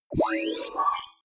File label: sci-fi menu open medium; energie